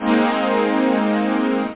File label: nuke, synth; da capo vol1; instruments